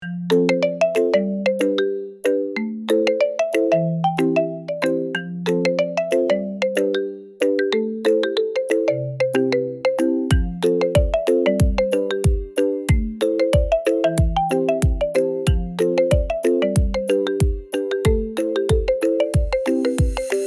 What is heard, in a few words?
Tropical uplifting mai tai melody